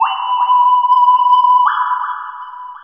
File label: FLUTE, 168 BPM; Ueberschall; Drum & Bass Flash Trackz